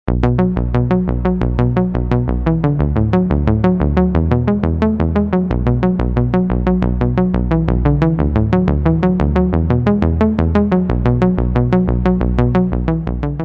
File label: p5 staccato